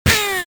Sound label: damage Taken; ritz